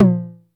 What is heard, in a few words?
909 HI TOM, TR-909; Crypton - DrumBase5000